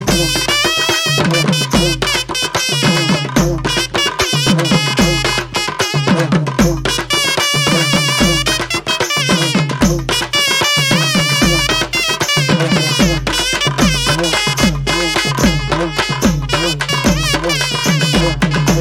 BGM

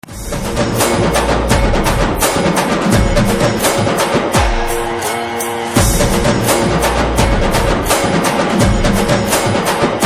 BGM Drum Beats

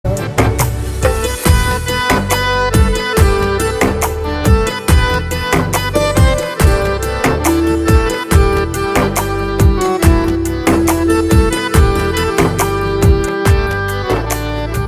Instrumental

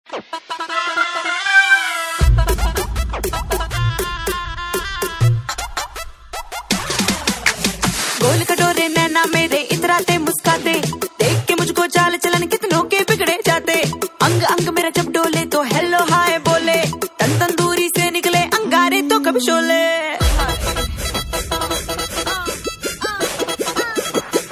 Ringtone

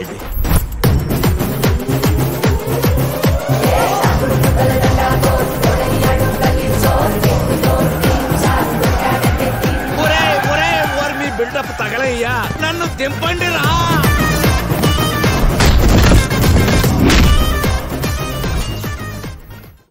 Teaser BGM Ringtone; Gully Rowdy

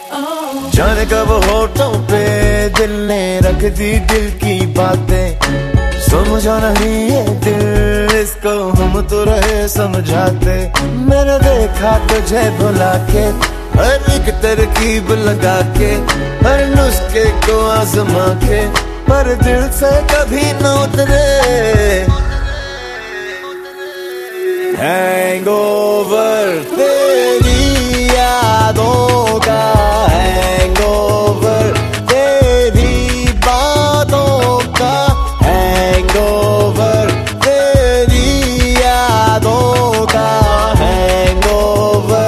Male Version

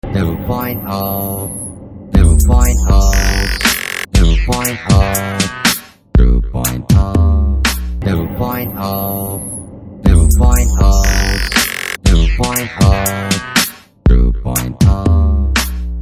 Theme Ringtone; Robot 2.0

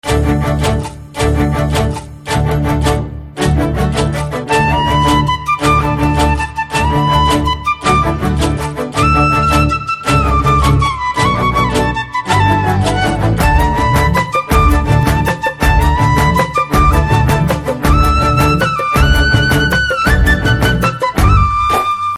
BGM Flute Ringtone

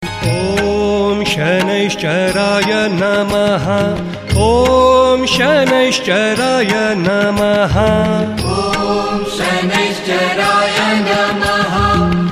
Jaap Ringtone

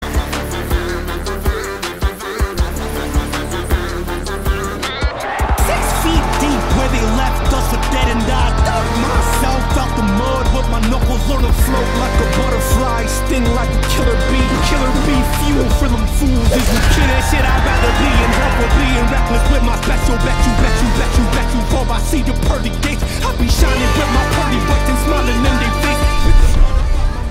Trailer Bgm Ringtone; Sarpatta Parambarai

